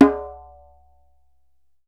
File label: DJEMBE, African Perc